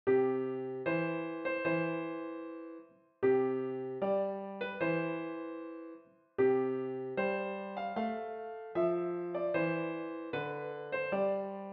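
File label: piano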